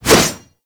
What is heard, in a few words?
Pole Arm Whoosh